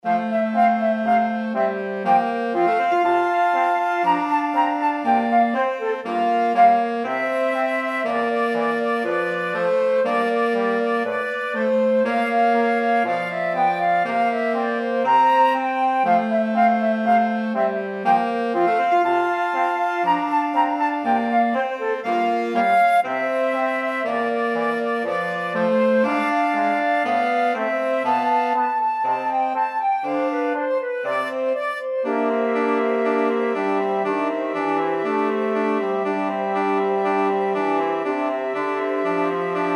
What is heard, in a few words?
flexible mixed ensemble 4 players